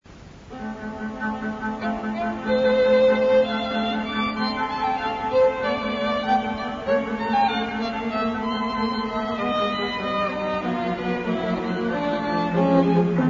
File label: Quartet